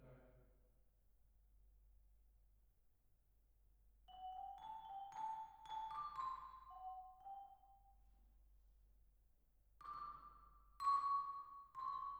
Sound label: Twin R Vibra